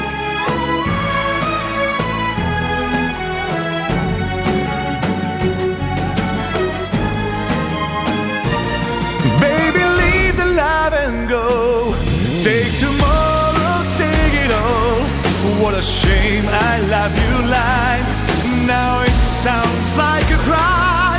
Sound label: Melody